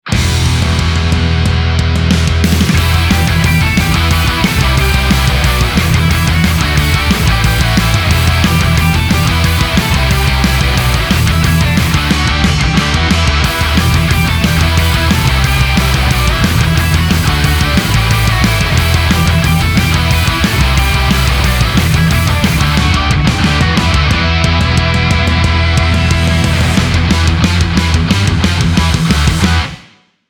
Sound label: ROCK HARD SMASH